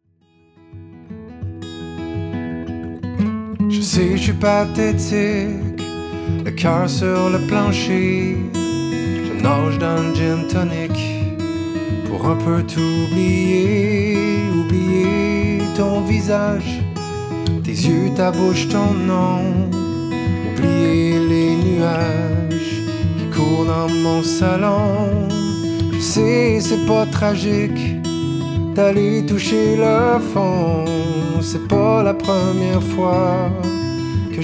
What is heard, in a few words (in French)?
chant; AgenceBD